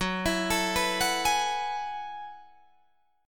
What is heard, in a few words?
F sharp Minor 11th, Guitar, Drop D